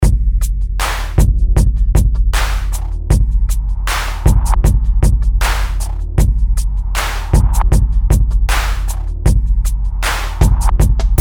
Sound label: Punch Hip Hop